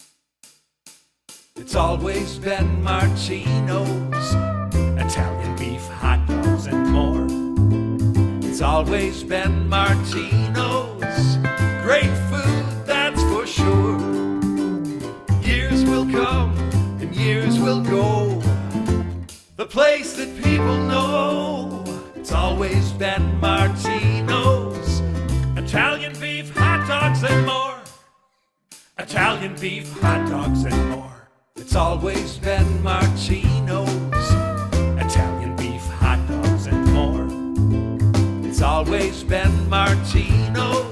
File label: jingle